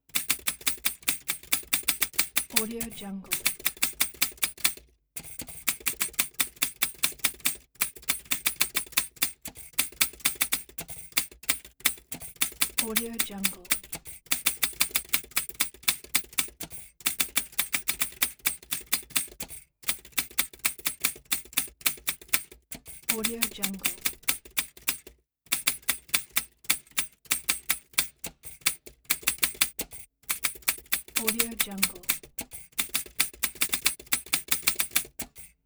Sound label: big typewriter typing full text fast